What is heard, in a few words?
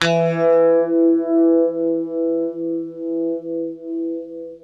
UNIVIB E, Guitar; East West; Steve Stevens Guitar Samples Collection